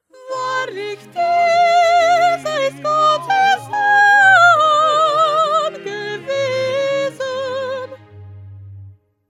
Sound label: Soprano